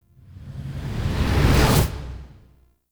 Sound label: Anchor Intro Stinger; Orbital Station